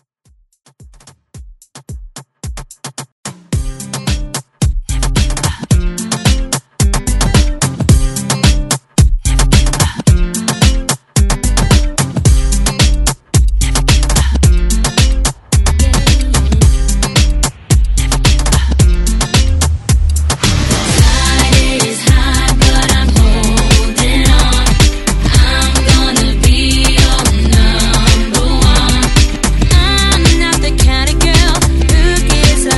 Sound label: Moombah; Redrum